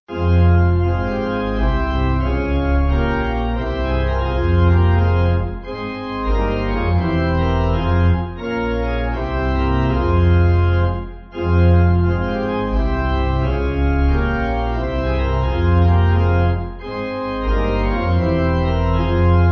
Singing Songs Pipe; Ebenezer

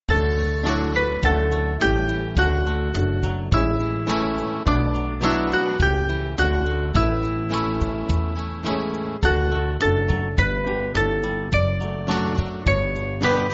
Band, church music

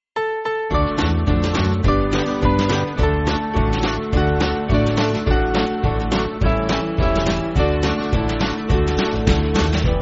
Music Backing Files for Churches; What A Wonderful Saviour; Hoffman

